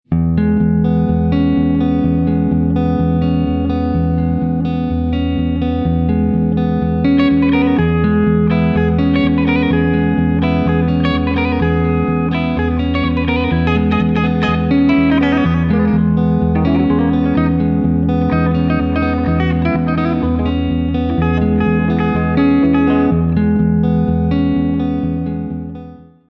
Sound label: Kora Full; West African rhythms